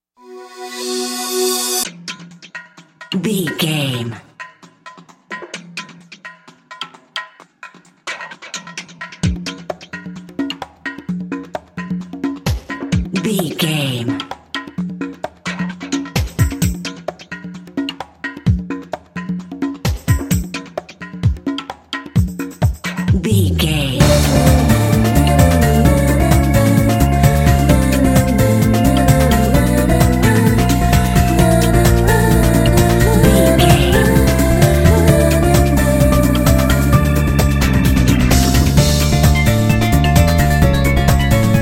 dancing piano

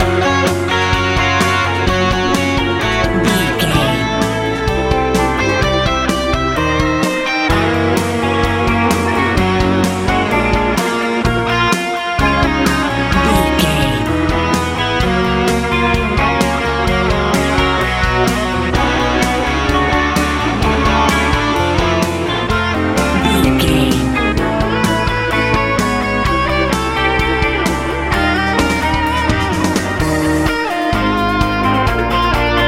hot blooming new wave

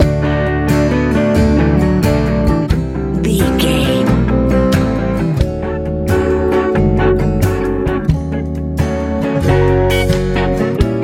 good powerful soul pop

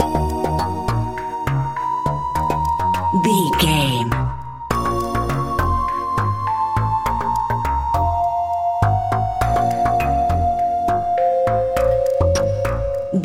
mysterious task video game bumper

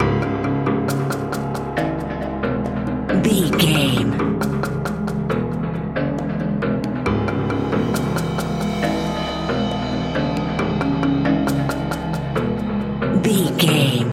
wilderness tension loop

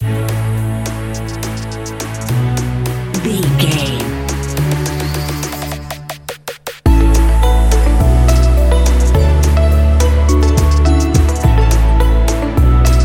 uplifting rising

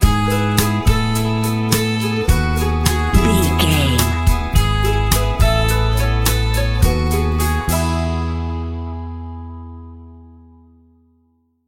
small steps bumper

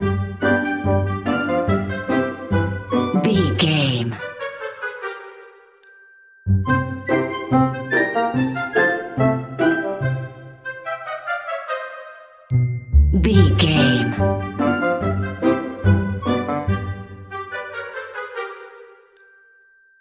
woodland critters play loop

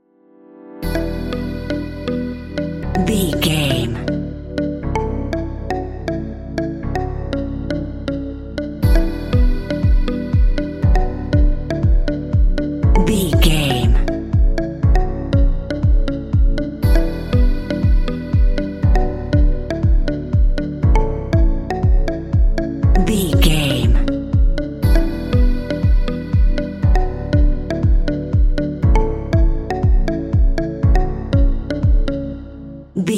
cool confident deep house

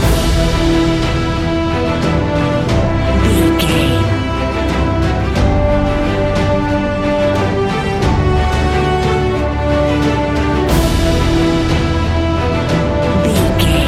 cinematic corporate loop

